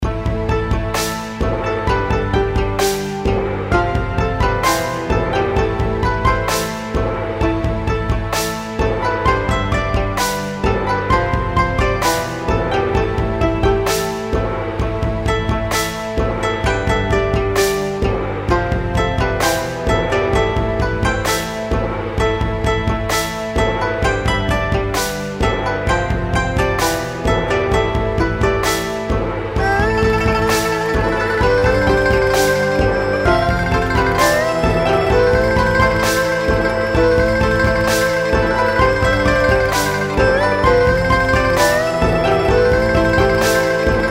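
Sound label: reel spin background, dragon boat; games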